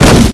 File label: crash